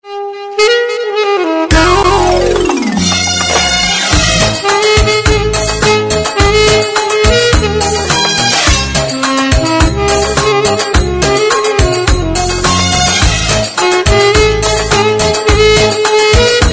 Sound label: Arabic; آهنگ های زنگ خور موبایل